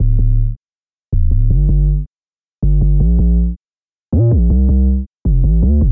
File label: Future Bass Melo Trap